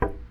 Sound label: Wood Hit